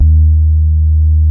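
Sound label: SUPPORT BASE, BASSES; Masterbits; Vintage Voltage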